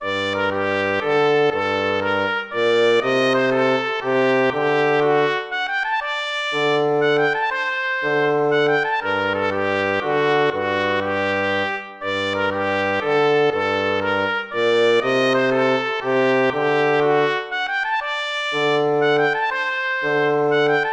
baroque minuet minor

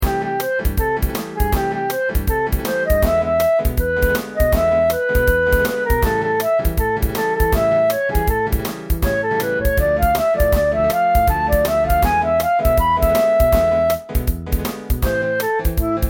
cha cha cha

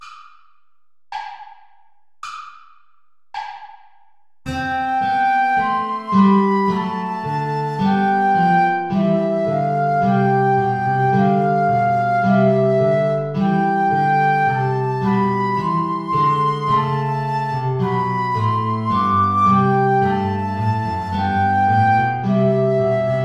play along without guitar; lobet den herren alle die ihn ehren

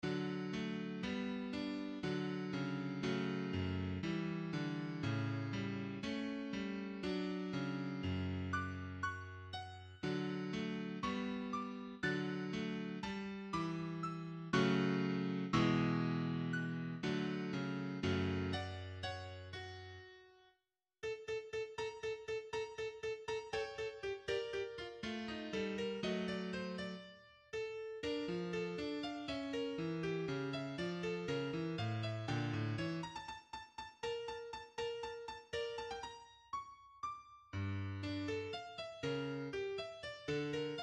minimal piano solo

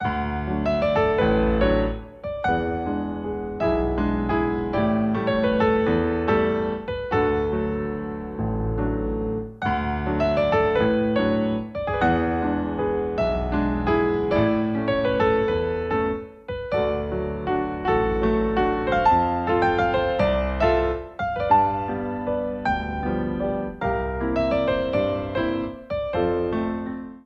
waltz major

